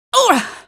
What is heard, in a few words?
pain, female